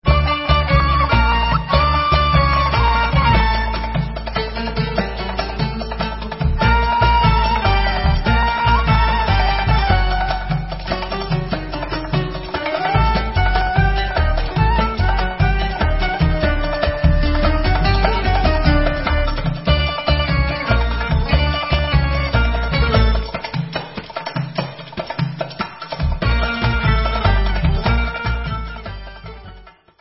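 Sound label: Debka Instrumental, Israeli Dances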